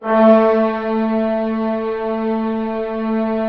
ORC A2 PP; Sound On Sound - Peter Siedleczek's Orchestra

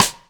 Rimshot; Equipped Music; Loungin House

